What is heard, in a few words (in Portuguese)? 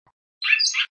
canto bicudo